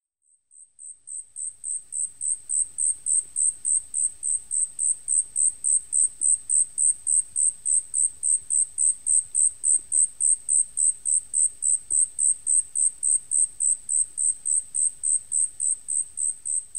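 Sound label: Asian stubtail, ehime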